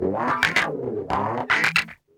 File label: GUI, licks; 110 LICKS